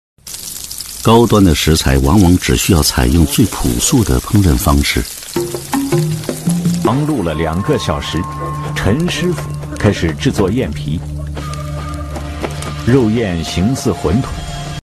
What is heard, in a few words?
chinese cooking